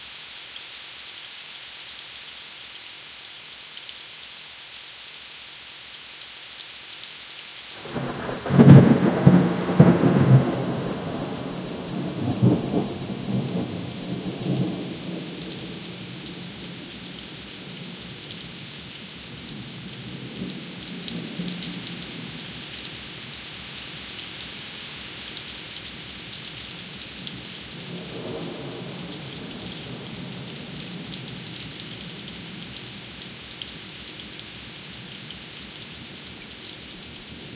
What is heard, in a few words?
soft rain distant thunde